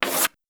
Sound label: write